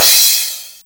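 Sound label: crash, DPM 48; classic machines; Sakata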